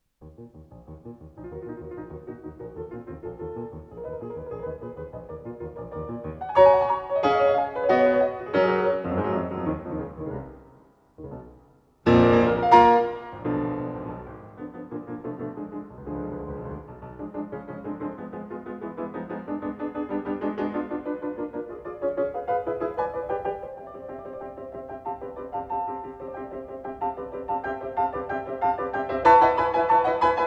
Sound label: Lyric Pieces for piano; Book 10, Op. 71; No 3, Kobold; Grieg